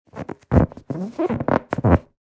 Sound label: Inflatable friction rubber surface squeak